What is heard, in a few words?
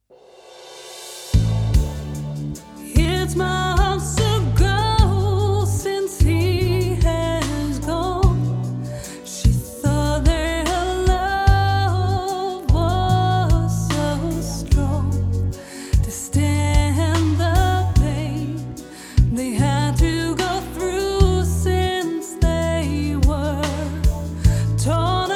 Reverb TC Reverb 4000